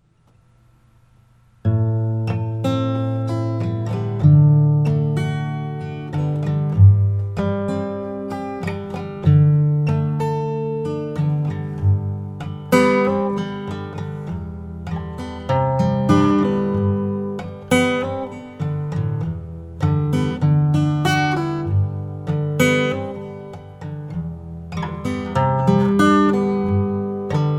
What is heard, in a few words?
Fifths; basement lullabies